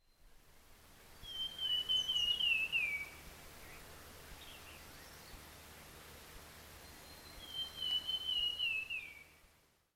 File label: sound bird